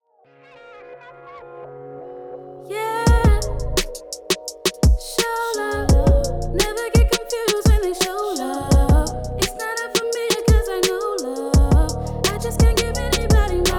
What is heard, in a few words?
Hip Hop